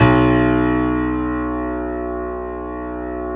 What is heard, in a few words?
JAZZ, Keyboards Acoustic; AKAI; USB Soundscan vol.17